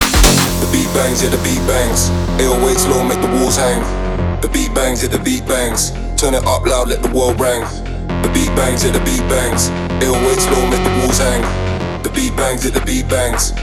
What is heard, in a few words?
Extended Mix